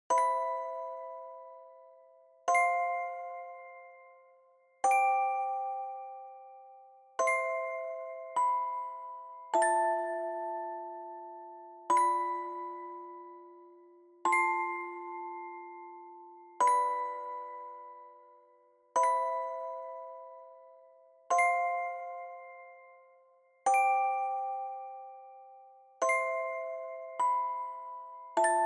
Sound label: Fast